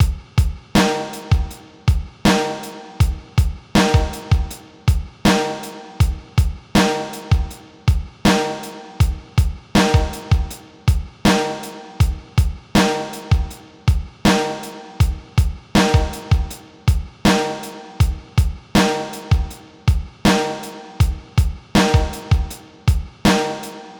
hall resonance loop 80

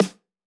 hiphop snare, Effect on

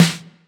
snare; Reference Beat BPM-130; Pophits

